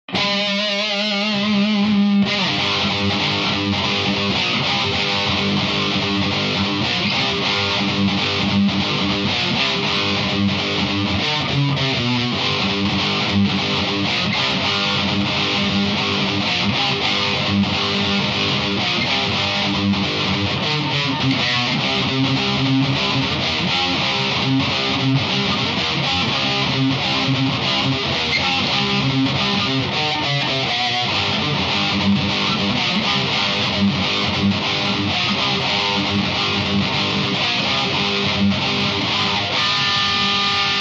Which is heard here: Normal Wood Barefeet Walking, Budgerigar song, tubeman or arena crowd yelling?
tubeman